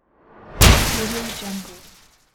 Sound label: explosion; fresh sounds